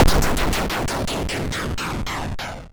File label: cluster, explosions